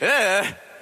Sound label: Ugh